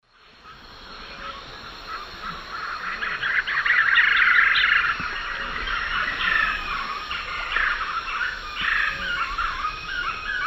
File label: Monasa nigrifrons, song; S. America; Eastern Lowlands